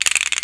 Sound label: CASTANET; Univers Sons; Basicussions